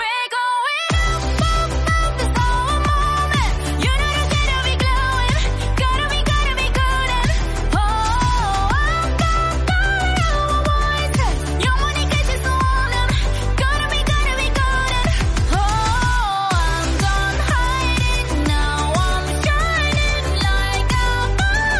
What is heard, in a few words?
kpop